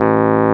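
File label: WURLY NO, Wurlitzer electric piano; Vintage; Sonic Reality; Vintage Timetraveler; WURLITZ EP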